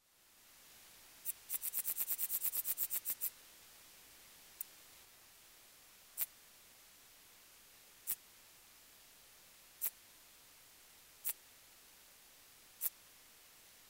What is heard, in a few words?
sickle bearing bush cricket